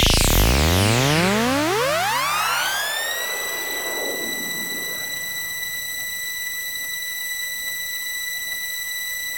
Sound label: GAME TOUCH HOLD MISS; maimai